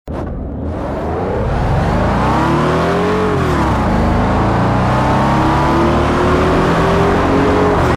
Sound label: first, vehicles; TDMCarpack; TDMCars